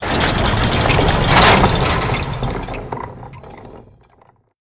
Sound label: Supply Tower Play